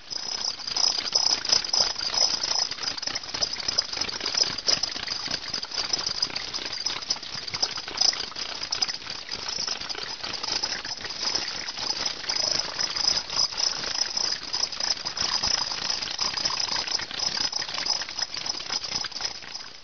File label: cicadas; de halla